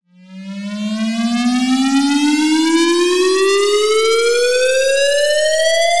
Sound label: Future Bass Riser 160 BPM F; FX; Risers; Cymatics; EDM Starter Pack